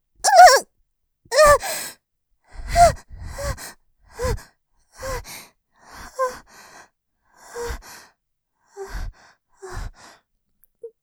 絶頂余韻 荒い息で脱力 吐息マシ; いっちゃったよぉ・・・; ループで楽しむ！シェフの気まぐれ抜き抜きコース; モノラル

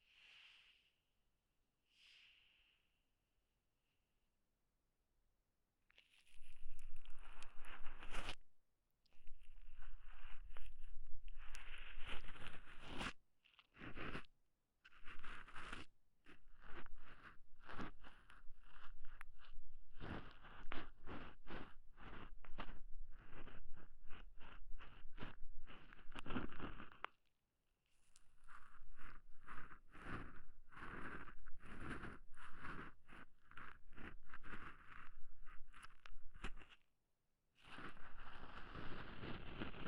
耳かき(綿棒左耳), ASMR

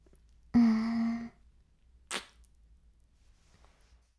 耳舐め音声; 白くて黒い魔性円環

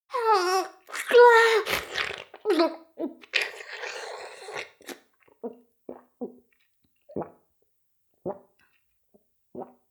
フェラ音声, ASMR; 援交JK魔法少女マスカリチェリー

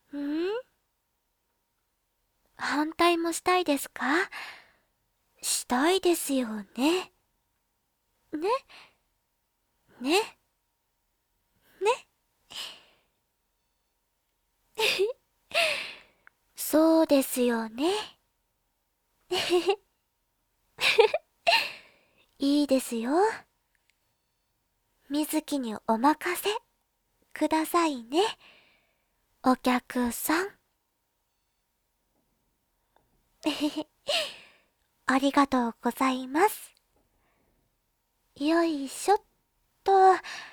耳かき！（左）

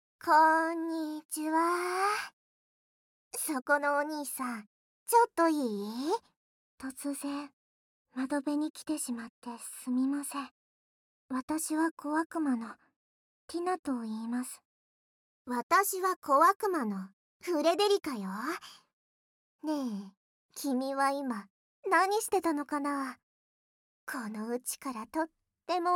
耳キス; 小悪魔との耳キス契約♪

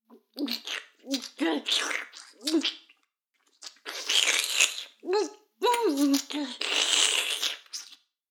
フェラ音声; 逆時間停止されて

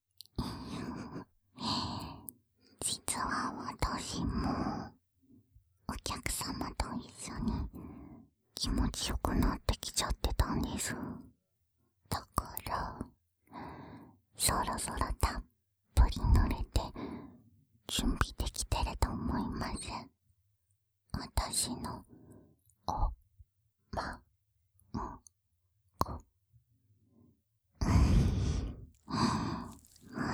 耳舐め, ASMR; ひまりと; 生セックス

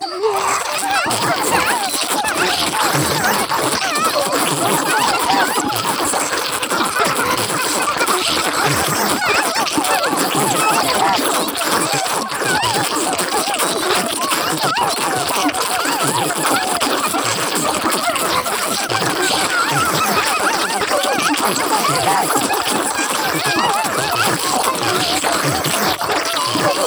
両耳フェラ; 両耳フェラ地獄

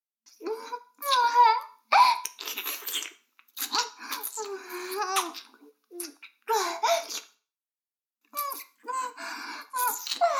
耳舐め音声; 逆時間停止されて

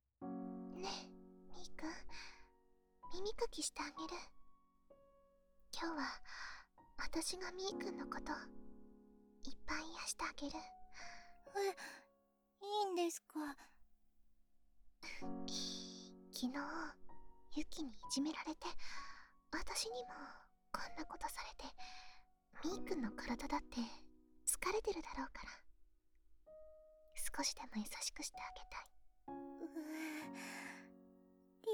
耳かきしてあげる…, ASMR; みーくん

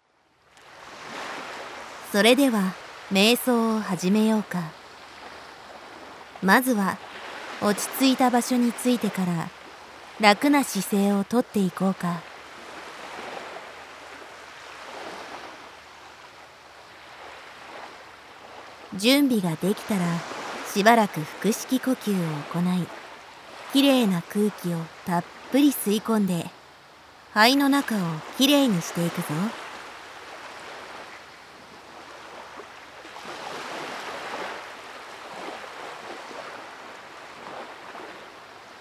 瞑想フリーワーク 海ver; フリーワーク用瞑想音源

